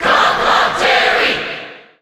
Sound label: Cheer English; Terry; SSBU